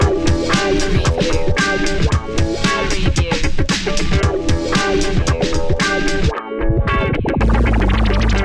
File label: hit the decks